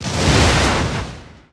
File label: Imp Appear